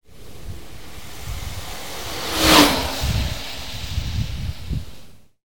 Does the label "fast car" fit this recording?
Yes